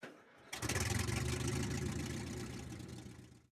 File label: start engine, engines; World of Tanks